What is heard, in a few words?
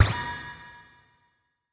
impact; ボードゲームやカジノ、麻雀の効果音です。